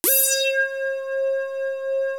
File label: JD synth